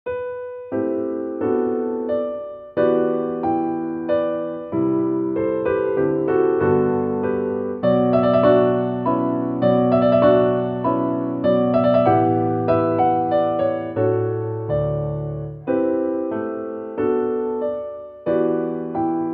menuet piano; classic; ravel; haydn

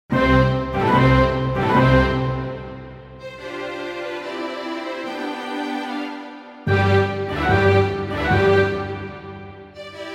symphony orchestra; mozart; 交響曲第41番第1楽章(冒頭部); モーツァルト

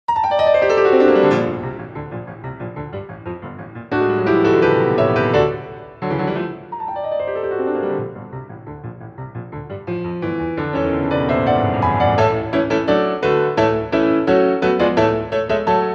piano; elgar; G.R.S(エニグマ変奏曲より); エルガー

